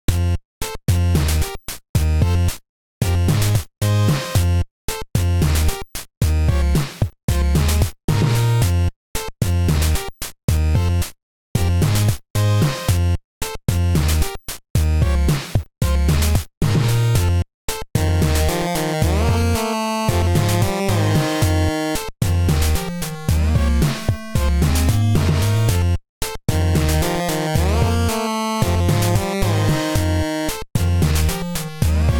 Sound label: menu, music; GameJam-polytech